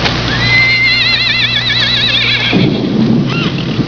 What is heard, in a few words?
neigh